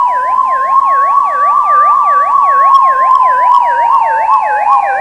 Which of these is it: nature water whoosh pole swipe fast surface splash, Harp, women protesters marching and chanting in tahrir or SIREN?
SIREN